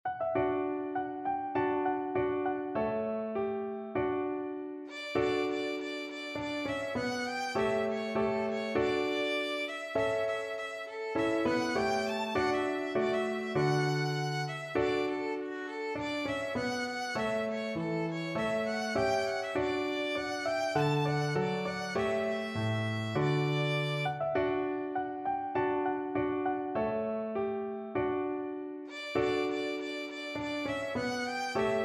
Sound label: violin; dicey reilly